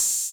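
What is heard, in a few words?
Open hi-hat 808 Color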